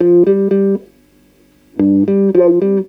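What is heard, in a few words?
DANCE guitar; USB Soundscan vol.32; AKAI; Roots Disco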